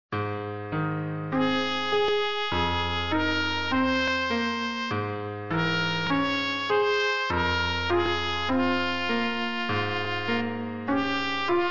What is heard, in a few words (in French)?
baladin pour trompette sib et piano